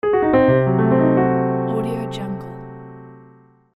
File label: mystery